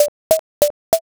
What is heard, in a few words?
ladder